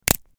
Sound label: stick snapping